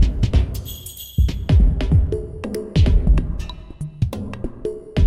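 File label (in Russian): барабанный луп сказочный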